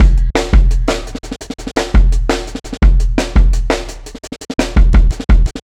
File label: Marching Kit 4 Bar, Drum n Bass Beats; KJ Sawka; Drum n Bass & Beyond